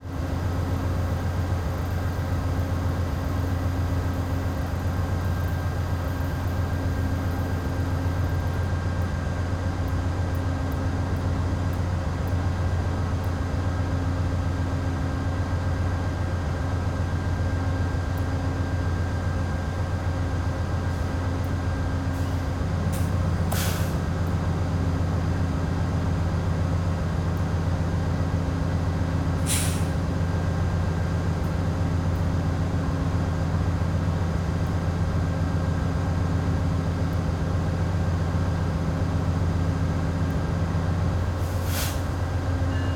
Train,Passenger,Diesel Electric,General Electric,Genesis,P42DC,Idle,Chuffs,Bell,Away; Passenger Trains; Airborne Sound; Vehicles Passenger Trains 1